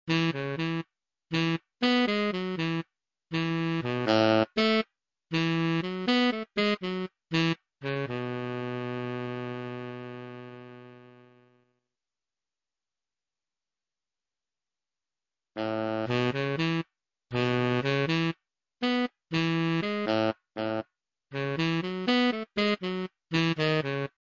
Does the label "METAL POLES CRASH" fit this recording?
No